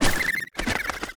PEACE DAMAGE; THORNASH; Mob

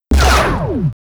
attack; Skouter; Mob

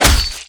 Knife Skin, Damage

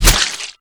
Spear Skin, Damage

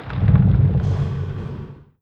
HOLY STONE KEEPER war walk

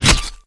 Axe Scale, Damage

